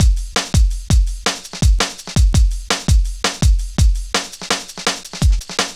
Drum Loops, Jungle, 166.5

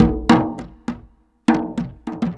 Java Ket Jak Harmo, Ethnic Percussion; Sonic Foundry (Sony Creative Software); Ambient Atmospheres & Rhythms

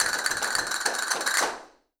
Castanets, Flamenco

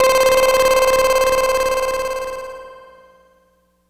Moog Stab Pulse, Synths; Sonic Foundry (Sony Creative Software); Electronic Point Blank

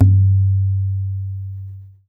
TABLA LO DOWN; Spectrasonics; Heart of Asia; INDIAN SET

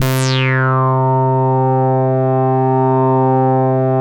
Dance Floor Bass, Multisample Basses

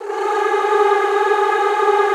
choir, G3; Best Service; Extended Classical Choir

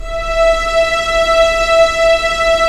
STR, Roland LA Composer

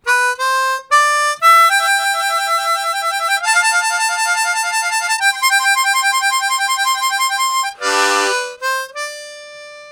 Rolling, Harmonica; Zero G

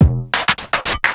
Drum FX

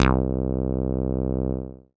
Acid Bass, Dance Synths

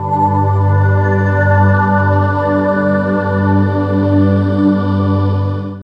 pads B; Zero-G; Total Drum Bass

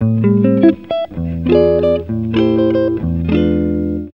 GUIT, Gipsy

